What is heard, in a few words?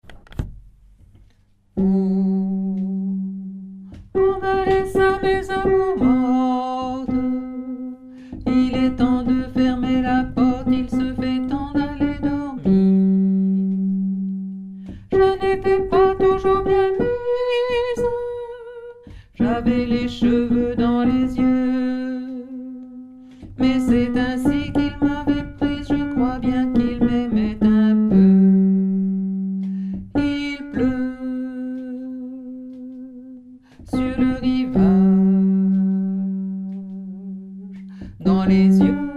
alto